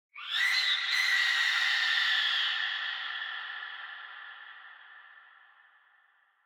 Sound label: ambience bad death whistle; smite; conquest